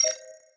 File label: Ui Menu escape Stereo